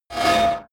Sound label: metallic swoosh signal; swooshes-swishes